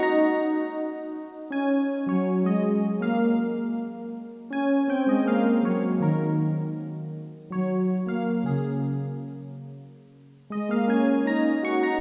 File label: Peaceful; Blockland